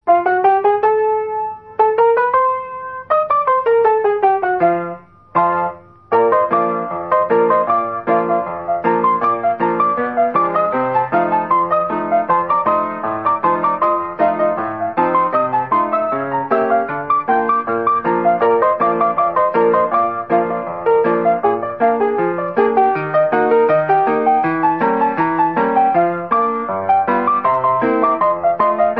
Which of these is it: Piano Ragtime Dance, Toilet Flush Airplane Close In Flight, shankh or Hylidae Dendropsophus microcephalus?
Piano Ragtime Dance